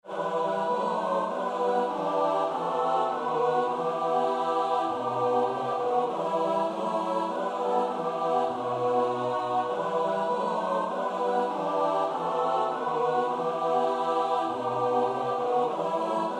(S-A-T-B), Oefenbestanden

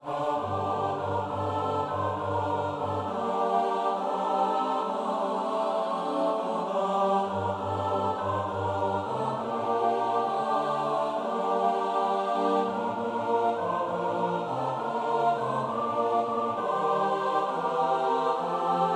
De engel Gabriël komt aangesneld (S-A-T-B); Vesper 34; NLB 443

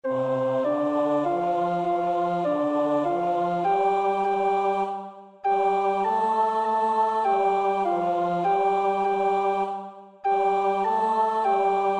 sopraan; NLB 369b-Onze Vader

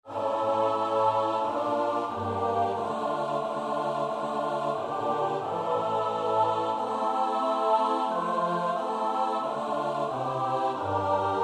U Here Jezus roep ik aan (S-A-T-B), Vesperkoor; Nieuw Liedboek; NLB 796; Oefenbestanden

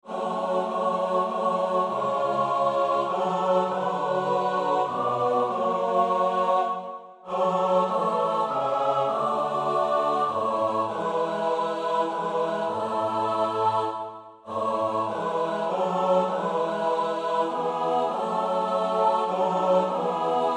Psalm 150 (S-A-T-B), Oefenbestanden; Schütz (Heinrich)

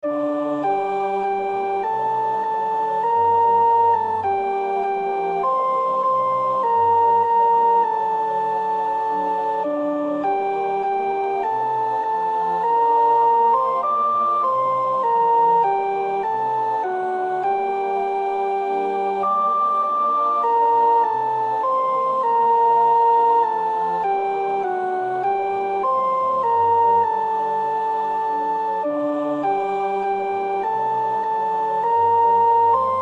sopraan; Nieuw Liedboek; NB 657 (gesplitst)

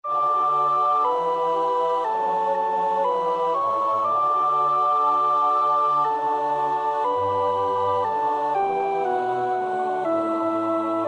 sopraan

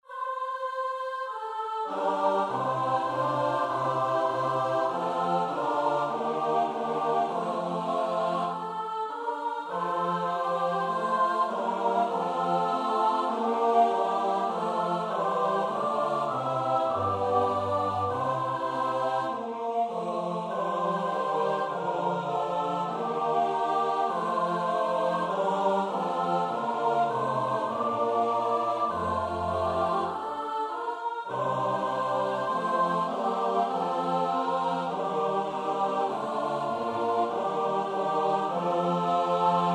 Psalm Koor S A T B; Oefenbestanden

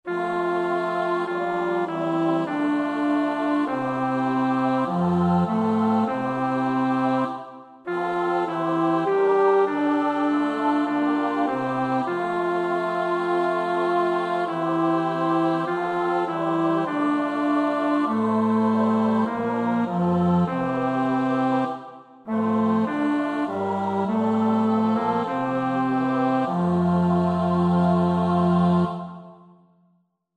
(bas), Oefenbestanden

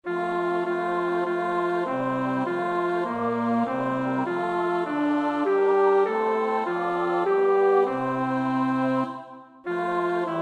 bas; Nieuw Liedboek; NLB 474

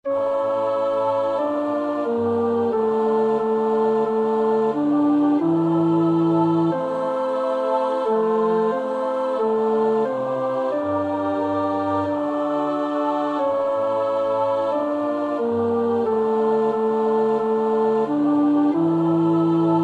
tenor; Nieuw Liedboek; NLB 796-U Here Jezus roep ik aan

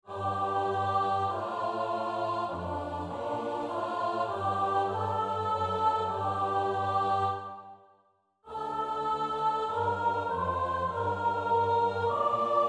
Psalm 142 (S-A-T-B), Oefenbestanden

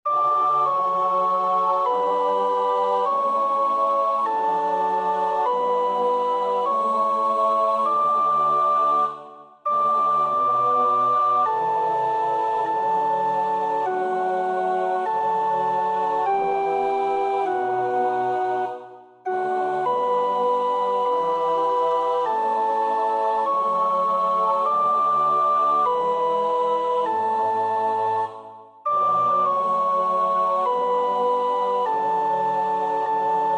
sopraan; Nieuw Liedboek; NLB 464; Oefenbestanden

